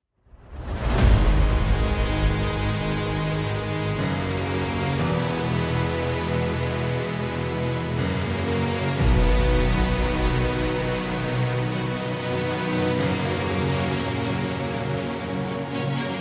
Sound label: Cinematic Trailer